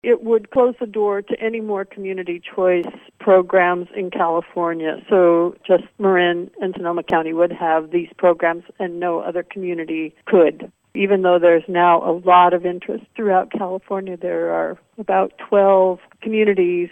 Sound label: squelch